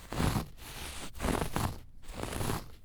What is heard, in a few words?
Scratchy Fabric; BB Retail Therapy Sample Pack